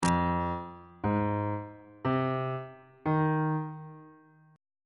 d sharp dorian 7th chord 2 is e sharp minor 7th on bass clef